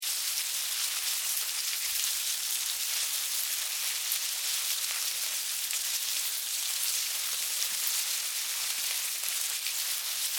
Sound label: Hive Crawl Bugs, Hive, Crawl, Bugs, Movement, Insects; Animals:Insects; Miscellaneous Insect; Blastwave